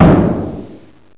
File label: clang